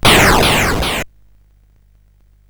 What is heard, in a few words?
sid CSG8580R5; hvsc